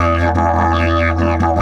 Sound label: 150, DIDGE RIFFS